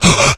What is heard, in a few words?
become alert, infected